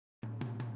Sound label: Boogaloo - Room M, 82 BPM; Drums; Intro; Sonic Foundry; Drums from the Big Room The Mixes